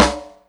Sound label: Snare Soft